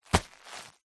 luggage leather handbag hit thud; foley

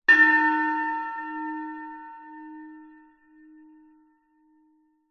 bells medium bell soft strike long decay

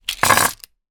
household plastic cutlery many down on surface; zapsplat

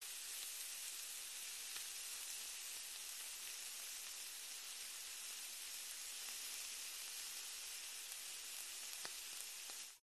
household kitchen cooking steak fry sizzle

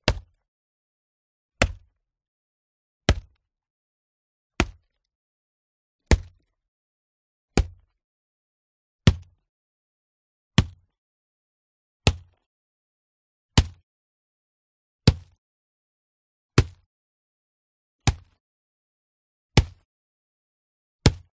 impact cabbage hammer; audiotank

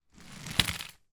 toy vehicle pass on carpet then roll

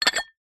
household small ceramic sugar pot lid off; zapsplat

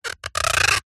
food pear rub squeak

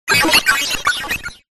science fiction robot tone beeps; zapsplat

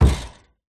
impacts wood thump with metal wire rattle

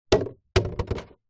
plastic milk bottle empty drop concrete; foley

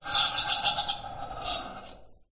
metal fork scrape garage door shrill squeak; foley

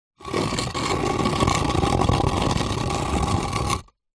foley concrete block move drag hard ground; zapsplat

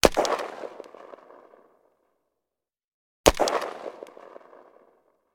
weapon gun ar 15; still north media

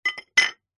foley wall tile small set down on concrete; zapsplat

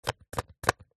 household hair gel pump dispenser push empty; zapsplat

